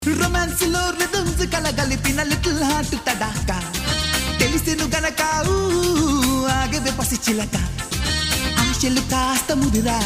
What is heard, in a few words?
Romance Rhythms